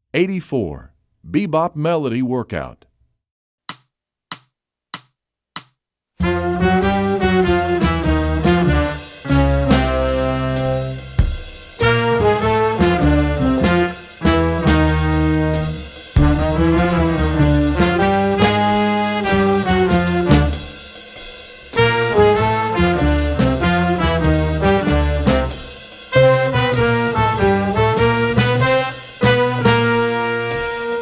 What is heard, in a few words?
bebop melody workout